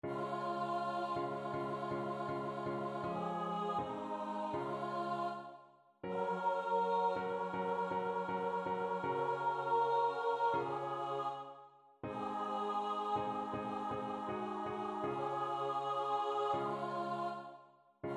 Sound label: Soprano; God Be with You